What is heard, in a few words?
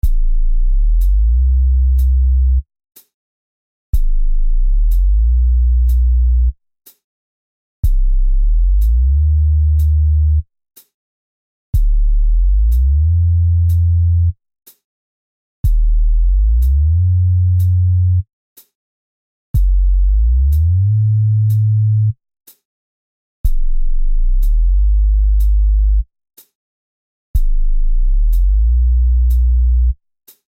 slow built bass